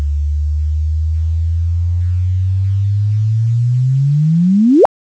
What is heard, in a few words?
noise